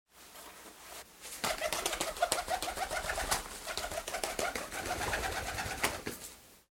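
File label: pigeon tries to take off in a dovecote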